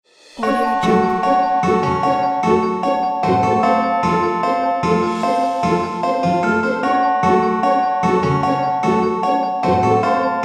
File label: halloween